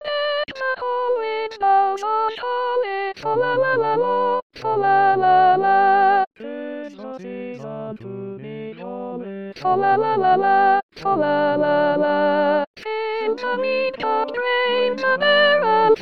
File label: Deck the hall Sop; christmas carols; Page 72 – Deck the Hall